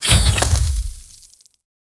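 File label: high speed ice spear magic, projectile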